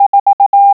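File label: FOUR, morse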